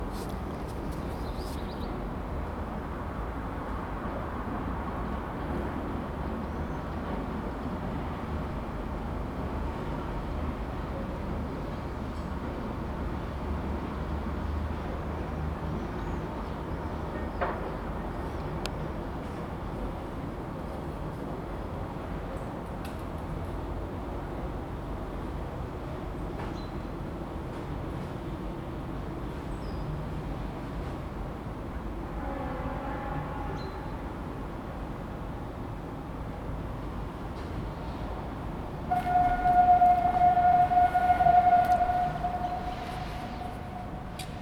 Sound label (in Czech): lokomotiva pres most